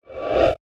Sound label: horror rising ghosts